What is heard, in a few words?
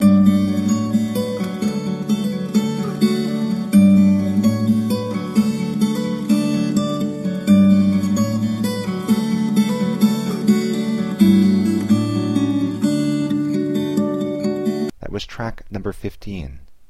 D, spoken, Jazz and Blues Loops; Loops For Licensing; MagnaLoops